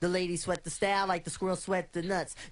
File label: acapella